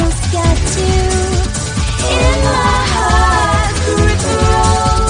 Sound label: add a female vocal melody electronic pop style upbeat and energetic